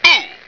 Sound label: boom; cstrike; taunts